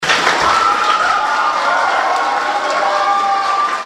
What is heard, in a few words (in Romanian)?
aplauze; radiotimisoara; Debut de an şcolar cu probleme, la Colegiile „Calderon” şi „Lenau” din Timişoara